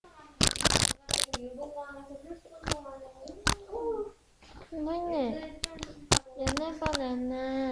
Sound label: door